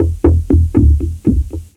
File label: Meltdown Bass - 9A; Jungle; Jungle - 1989 to 1999